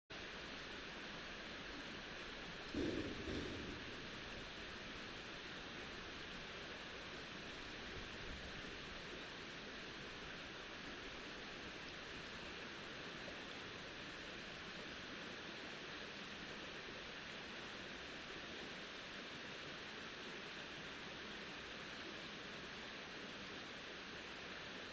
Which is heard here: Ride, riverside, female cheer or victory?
riverside